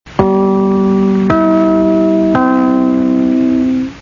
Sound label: nbc chimes